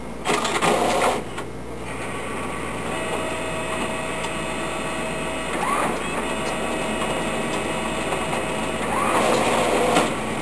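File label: photocopy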